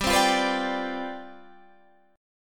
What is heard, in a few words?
C Major 7th Suspended 2nd Suspended 4th, G, chord